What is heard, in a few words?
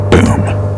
boom